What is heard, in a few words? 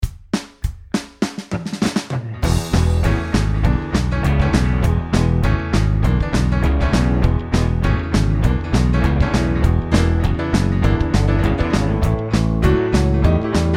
blues backing track